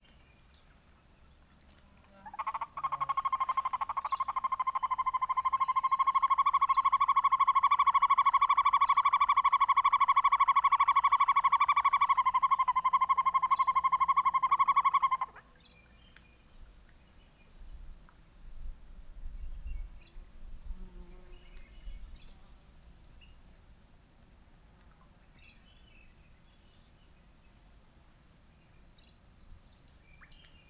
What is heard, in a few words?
American Hawk Owl Hooting of male